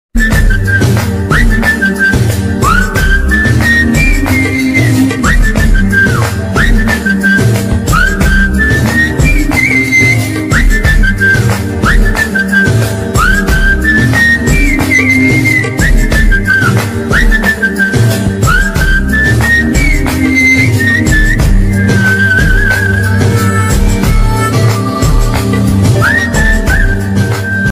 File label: love bgm; ayan